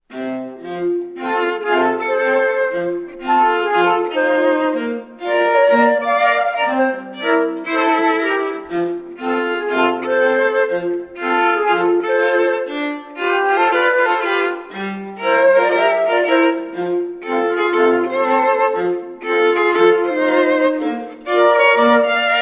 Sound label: Ragtime